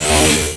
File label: z saber; MMX4SFX